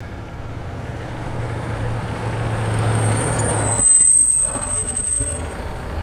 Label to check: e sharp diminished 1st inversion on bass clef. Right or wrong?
Wrong